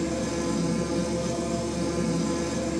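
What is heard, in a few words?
vehicle landspeeder idle loop; vehicles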